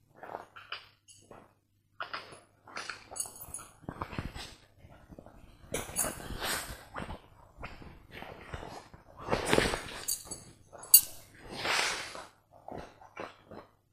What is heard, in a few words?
belt tight; operation